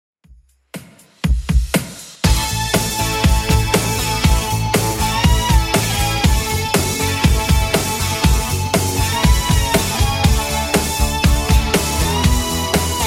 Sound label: 80s Club Edit 120bpm